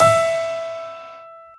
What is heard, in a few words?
normal hit whistle; osu!